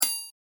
Beep Simple Clean, A Simple Beep Without Additional Effects; Multimedia; Crafted Signals